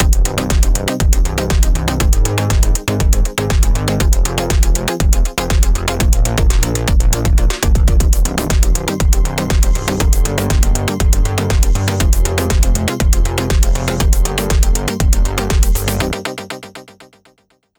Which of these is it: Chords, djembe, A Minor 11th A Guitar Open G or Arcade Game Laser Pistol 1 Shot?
Chords